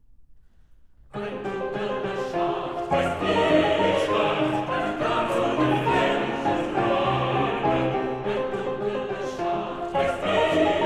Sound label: Waltzes for vocal quartet & piano, 4 hands; Liebeslieder Waltzes (18); Brahms